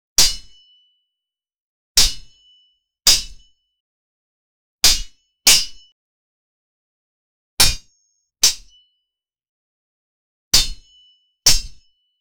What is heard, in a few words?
a sword slash